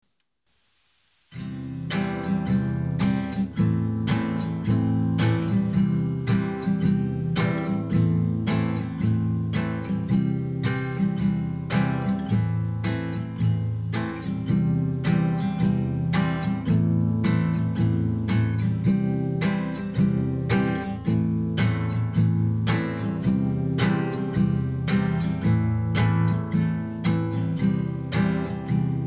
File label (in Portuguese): Ritmo Facil No Violao Xote